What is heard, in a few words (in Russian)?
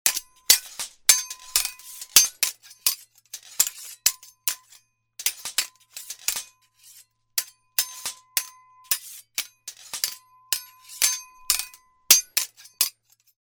2 rycharja